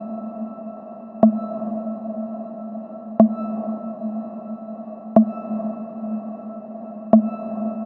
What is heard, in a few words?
Atm Drop Loop Am, Deep House; 122; Techno; Ghosthack; AC2023 - Day 17 - Dub Techno & Deep House Chords